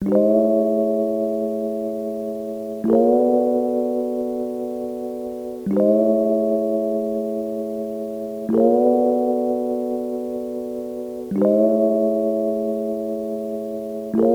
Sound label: E Piano, 85 BPM A Maj; Melodics; Cymatics; Eternity Sample Pack